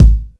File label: Misc Kik; Mo' Phatt; Digital Sound Factory Mo' Phatt